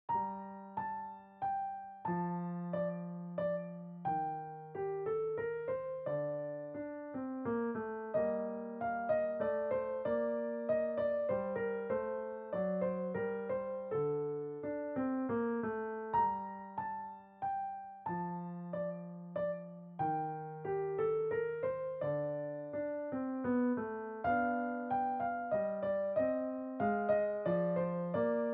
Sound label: Menuett i G moll, piano